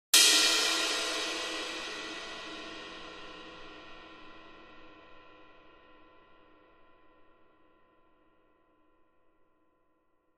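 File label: Cymbal Medium Single Hit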